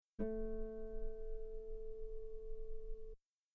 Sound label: guitar A3 very long pianissimo harmonics; bananenkeyboard